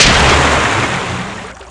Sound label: SPLASH